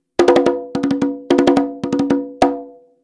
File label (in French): frise, congas